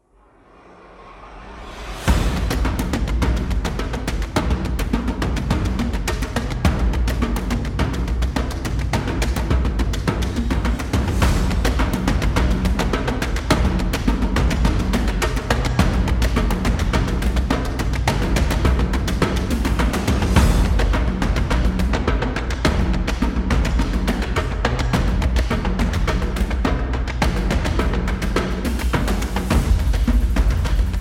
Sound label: Tribal